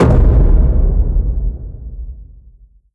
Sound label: dog entry land; npc; scripted